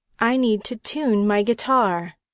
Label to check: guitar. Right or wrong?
Right